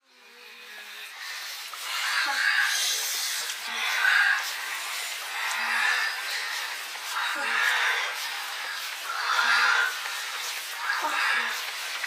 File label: Exciting Game, dynamite drummers percussion drums